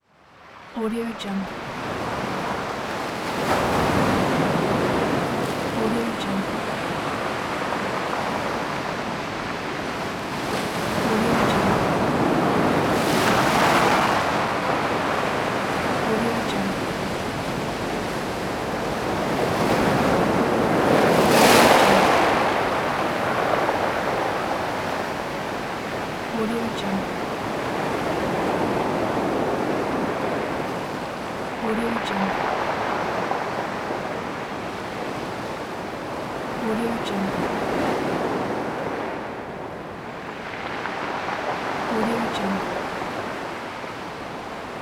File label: sea waves sound